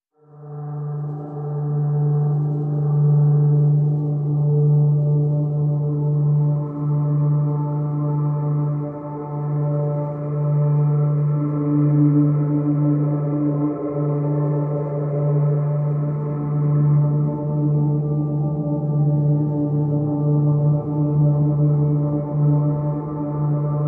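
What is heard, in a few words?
Drone D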